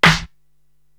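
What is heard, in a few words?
snare drum Rap, Drum Machines, Korg TR-Rack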